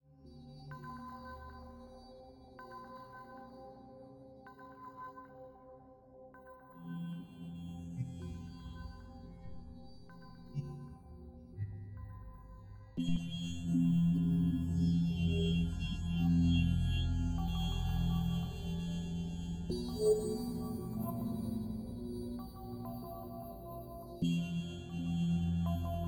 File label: Somber Ambiance